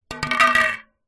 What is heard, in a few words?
tin small empty no lid down on hard ground; foley; zapsplat